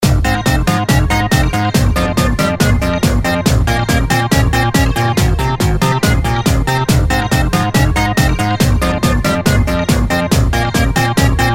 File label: Drop Dance